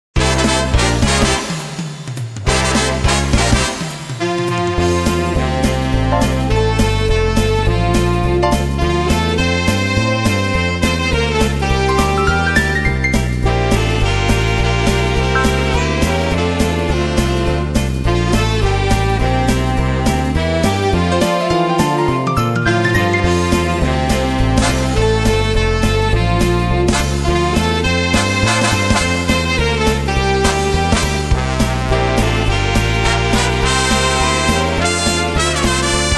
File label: Quickstep